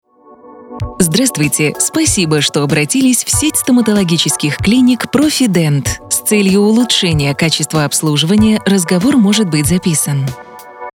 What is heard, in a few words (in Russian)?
музыка; Профи-Дент